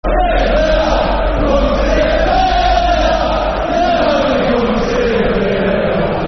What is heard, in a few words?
You've Only Come To See The Villa, Football Chant (Soccer Songs); Aston Villa Avfc